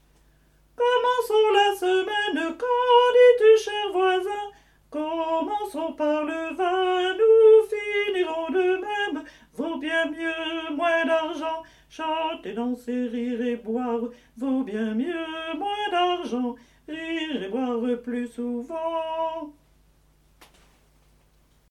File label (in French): hommes